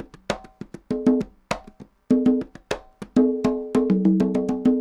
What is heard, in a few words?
conga 100 bpm, latin; Sound Effect Library